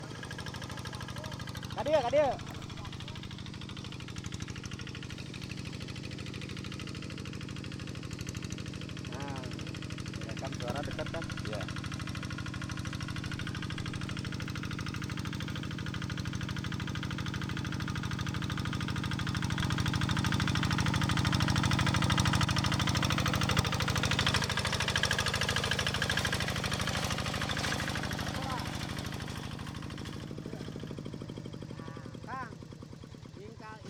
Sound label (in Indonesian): sfx traktor sawah